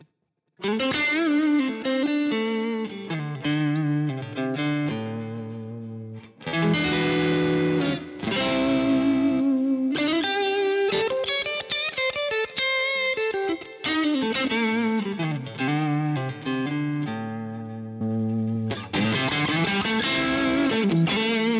Headrush Core Modified Brit 800 Stack Breakup Comp; Grey